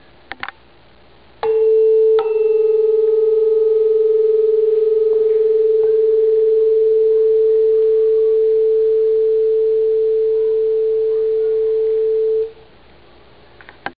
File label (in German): Schwebung schnell